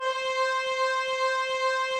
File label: Instrument